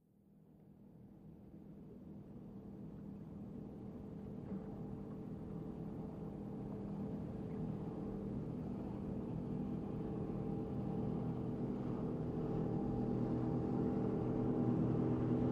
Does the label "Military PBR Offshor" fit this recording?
Yes